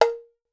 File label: CHILDS CO, POP ROCK SET